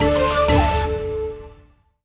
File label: cascade win; The Golden City